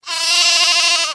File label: Sheep Action